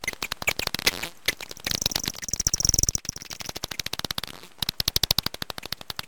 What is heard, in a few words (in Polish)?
Borowiec i karlik